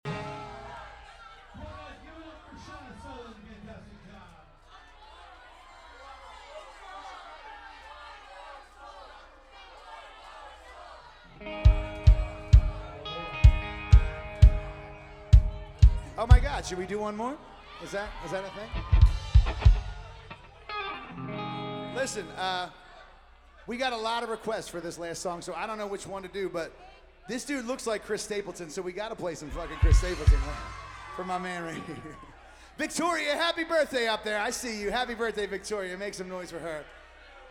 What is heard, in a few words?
Encore Request No Bass; VillagePub